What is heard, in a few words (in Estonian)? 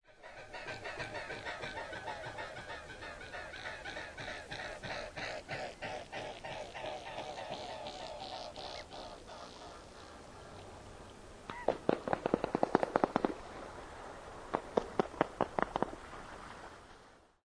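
küürnokkluik; Kühmnokk-luik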